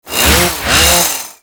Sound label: Crazy Swipes, Attacks; Warframe; Grineer Ghouls; Ghoul Sawman